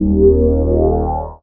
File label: suit, items; quakemapping